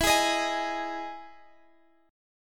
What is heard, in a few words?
E Major 7th E down Guitar Standard; EM7; strummed; capo=2